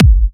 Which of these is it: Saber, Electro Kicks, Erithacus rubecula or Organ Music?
Electro Kicks